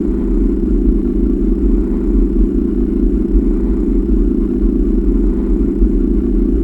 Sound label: Starship Pulse High